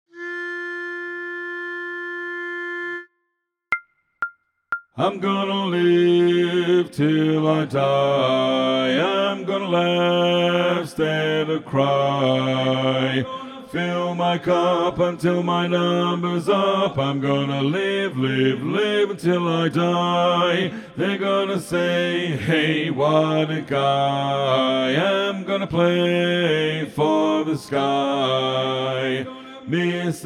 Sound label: bass loudest